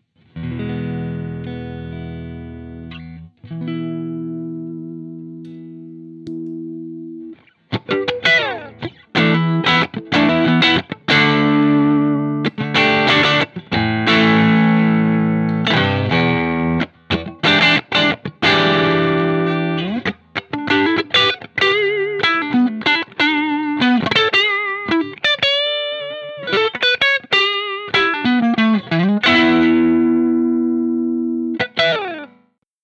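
Stratocaster Honk Machine Marshall 2203 Soft overdrive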